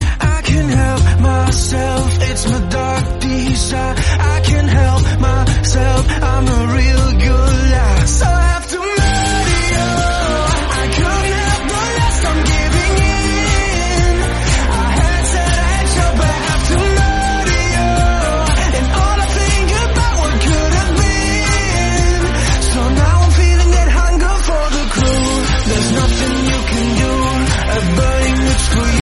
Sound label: English song tone ringtone